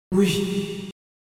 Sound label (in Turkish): Ters Reverb Efekti